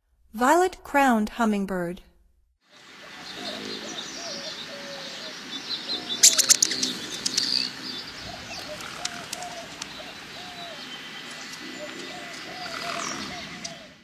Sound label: Violet-crowned Hummingbird; North America; Stokes